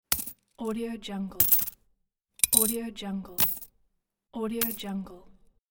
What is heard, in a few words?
piggy bank top up